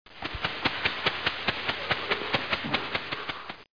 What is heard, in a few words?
puffy wing flaps; DR BRAIN; BUGS